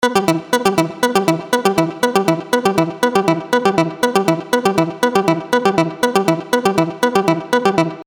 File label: Arp Down